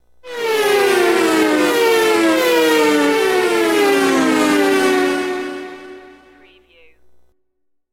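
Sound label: Speed Cars Pass By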